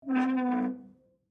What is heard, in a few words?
Safe Door Open, Office, Large, Metal, Squeak; Metal Doors; BlastWave